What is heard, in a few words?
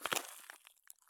walk gravel; Escape from Tarkov